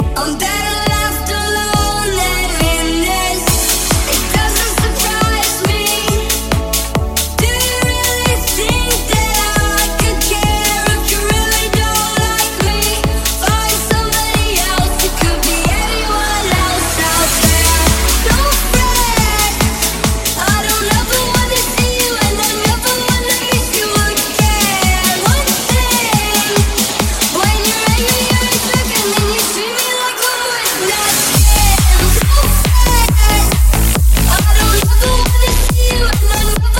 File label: Slam End 138bpm